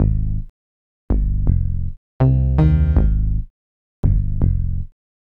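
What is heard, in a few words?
bass, Ab, Hip Hop & RnB; How I Feel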